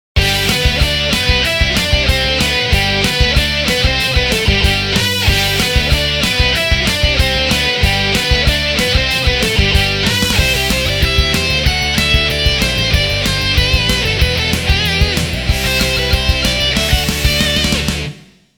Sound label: Punk ringtone; Nokia Ringtones; Audio themes